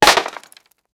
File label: destruction